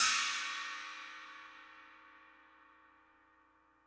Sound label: China China High Tip